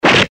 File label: eastern swishes